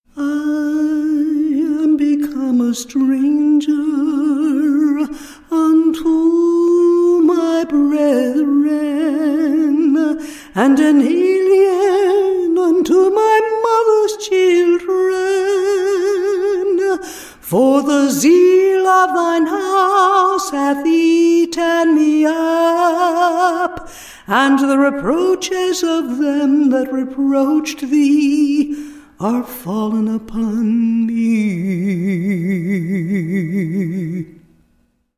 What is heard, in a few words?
a capella scripture song